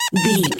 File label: voice fun creature squirrel